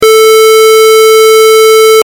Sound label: square wave